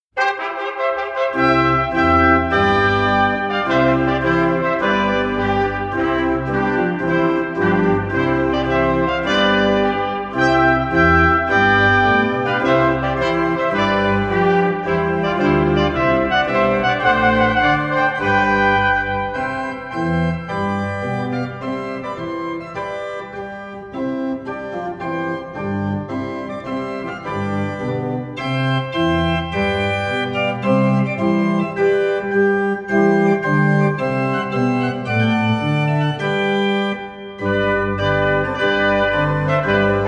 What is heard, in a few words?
Trumpet Tune